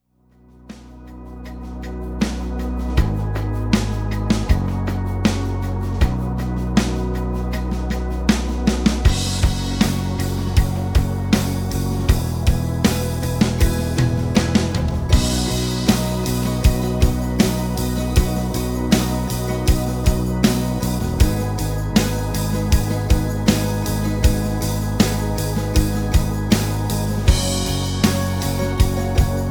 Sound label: rhythm